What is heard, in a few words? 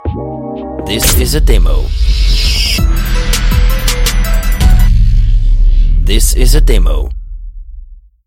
Hip Hop RnB With Short beat